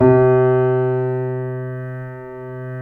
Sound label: C7 PP, The Ultimate Piano Collection; East West